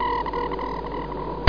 drone; Q2 Codered

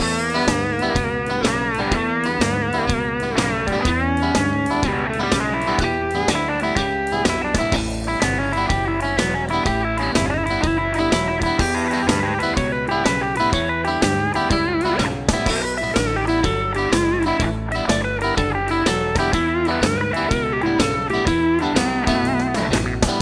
fender Fast Loop; SGI O2 Out of Box Experience 2.2; fender gallery

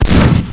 BULEXP; Virus

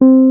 Wurly F4; SoundFont - The Developer's Choice - Volume 1

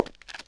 Hard Step, Footsteps

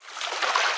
swim Start; Singles Flirt Up Your Life

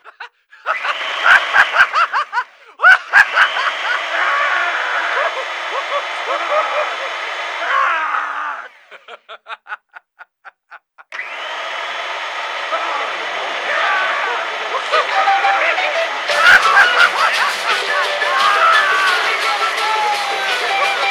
tv Horror; Singles Flirt Up Your Life

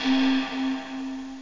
Recorder; OctaMED SoundStudio V1; mirage

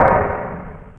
section Nav click; The Lord of the Rings The Two Towers CD Cardz Warriors

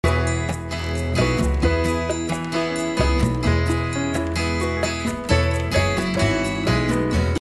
theme loop; pet

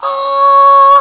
ahh; 7invasores

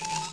aliens

